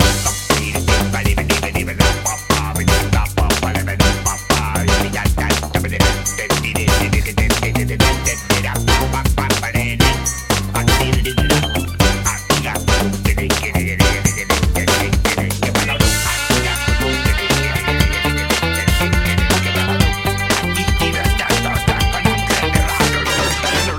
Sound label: ambience Free Spin Start; scruffy duck